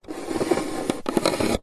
food drink plastic straw slide in plastic fast food drink lid; zapsplat